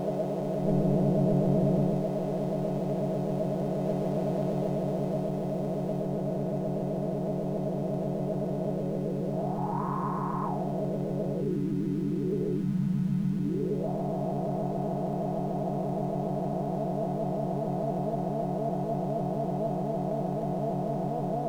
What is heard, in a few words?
aliens no others, weird sound generator samples